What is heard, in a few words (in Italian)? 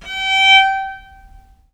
G4, Historical Instruments, VIOLE MUT; USB Soundscan vol.23; AKAI